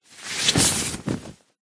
foley clothing shirt movement rustle arm out sleeve; zapsplat